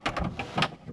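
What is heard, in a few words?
toilet open; wc; Mafie 1 cz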